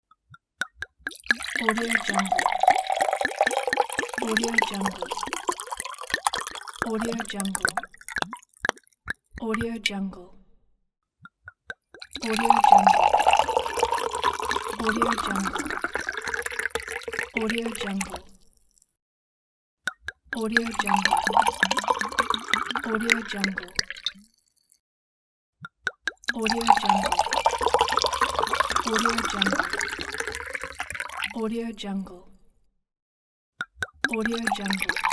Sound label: wine bottle pouring